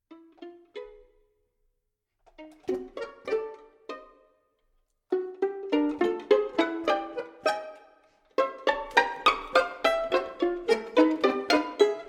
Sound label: Pizzicato Polka; Friedrich Cerha